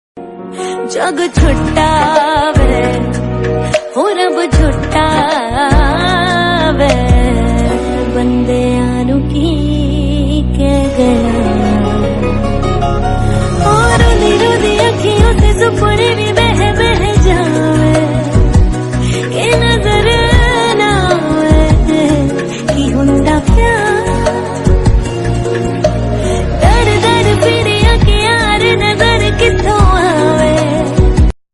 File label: female hindi ringtone